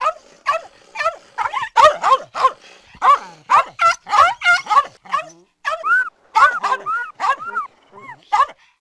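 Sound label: fight; Dorling Kindersley My First Incredible Amazing Dictionary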